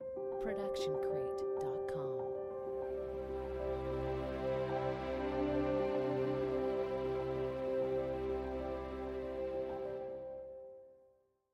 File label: Corporate Ident Pianissimo; SoundsCrate